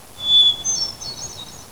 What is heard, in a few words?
hermit thrush